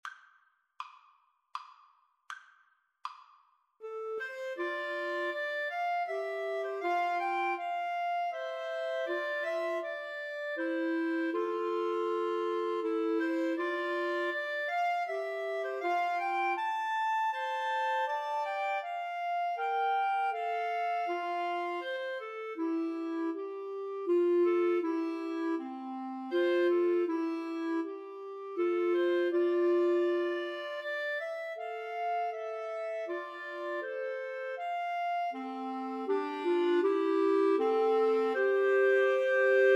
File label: Clarinet Trio